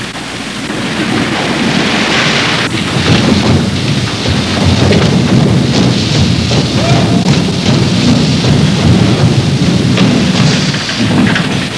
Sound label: crusher